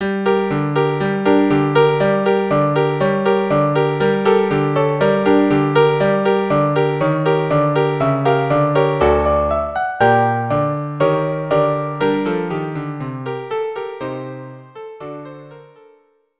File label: Violin; Khosn Kale